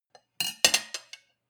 cutlery on plate place soft; berufe; gastronomie; soundpool

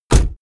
car doors

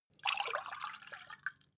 glass pour fast; soundpool

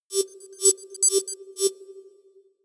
computer sound, signale; soundpool

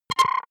button, signale; soundpool

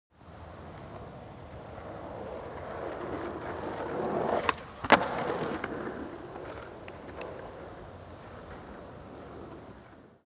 skateboard single drive by jump small

